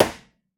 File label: Balloon Pop; Bad Piggies